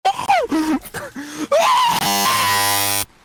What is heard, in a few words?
rage glitch